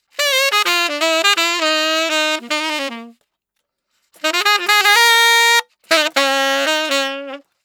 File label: 080 Acid Jazz sax Bbmaj; Brass; Digidesign; Pro Tools; Pro Tools Essential Loops